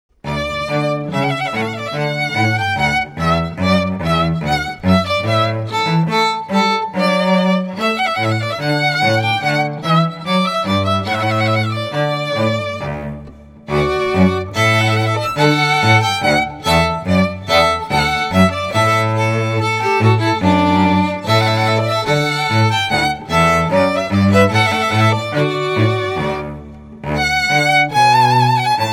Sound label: Trumpet Voluntary